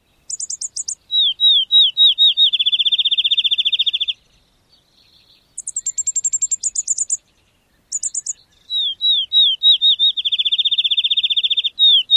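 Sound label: Field Sparrow dawn song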